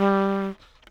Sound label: Eternal Sleep Trumpet G2